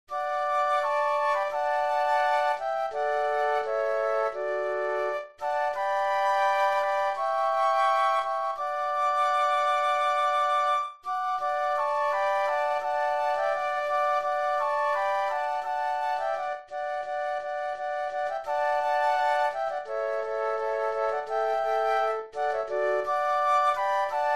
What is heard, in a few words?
Flute Trio; christmas